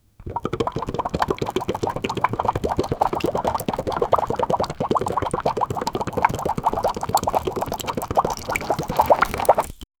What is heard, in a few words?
bubble gum liquid flow